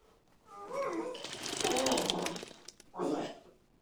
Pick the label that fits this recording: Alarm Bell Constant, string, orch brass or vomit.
vomit